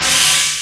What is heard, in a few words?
LARGE FX; USB Soundscan vol.24; AKAI; Industrial Loops